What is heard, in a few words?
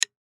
Drum Sticks Hit, Drum Sticks Hit Together Single; Drum Kit; Blastwave